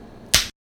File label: Bone crack